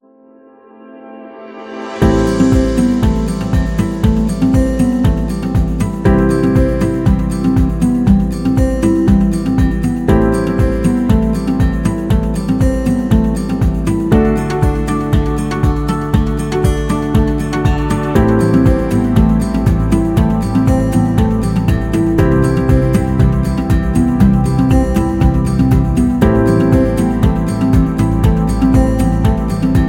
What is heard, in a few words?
Guitar